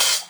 Hihat Open Vinyl, drums; One Shots

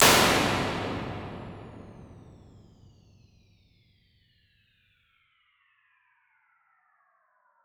Plates Plate A; Impulse Responses; Ableton Live 12 Lite

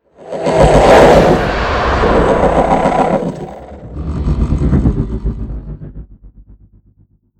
Summit Intro Guardians Final Divebomb Quad; Journey

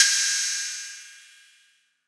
Ride Low; One Shots; Drums

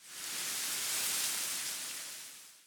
tree wind rustle; background

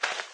foot walk; There; ThereClient

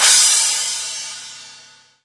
Crash DMX Real, Cymbal; One Shots; Drums; Ableton Live 12 Lite; Core Library

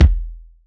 Kick Solid

